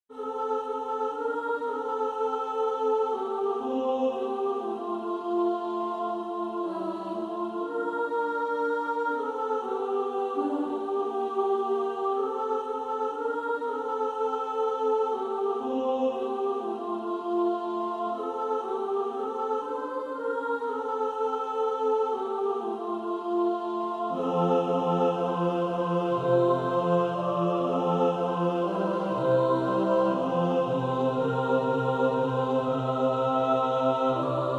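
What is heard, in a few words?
mixed, practice music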